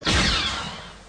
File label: blaster